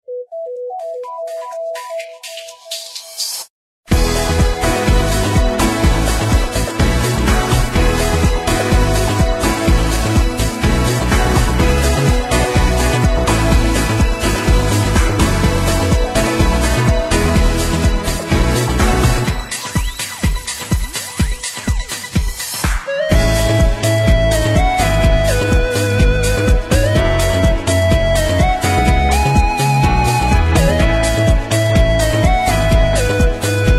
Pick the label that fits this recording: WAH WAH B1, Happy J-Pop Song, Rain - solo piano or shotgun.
Happy J-Pop Song